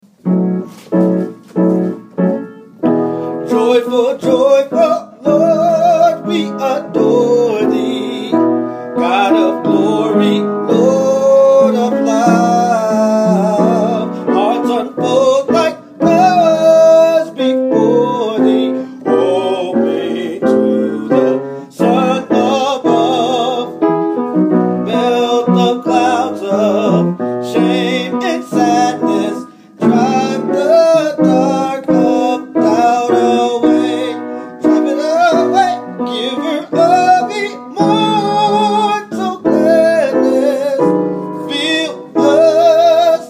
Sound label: tenor